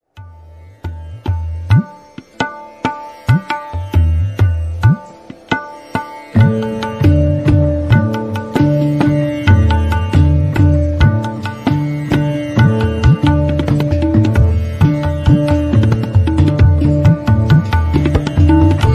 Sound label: Indian Instrumental Music; آهنگ های بی کلام هندی